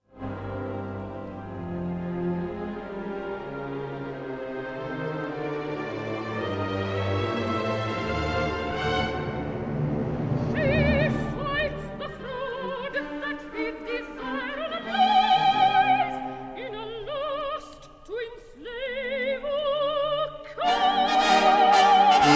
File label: for high voice chorus and orchestra; Spirit of England op 80